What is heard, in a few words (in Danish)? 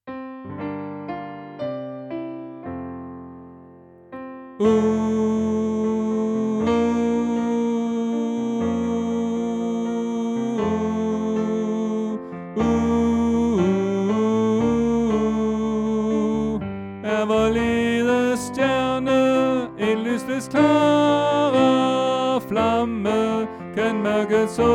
Tenor